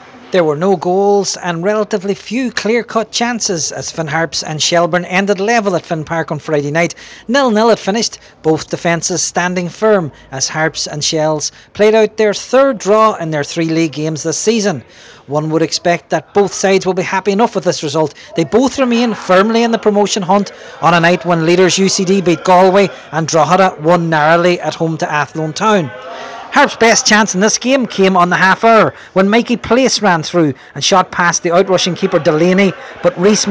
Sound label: HARPS